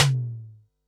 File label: tom, Pearl SC-40 Toms